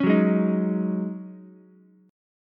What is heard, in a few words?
G sharp 7th Suspended 4th G sharp, Ukulele; G#7sus4 strummed; JGuitar